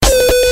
noise

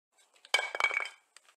wooden pipe falling

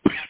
cough

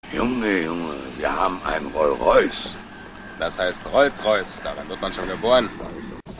rolls royce

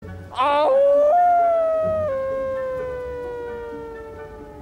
wolf howl

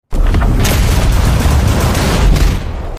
flowing water

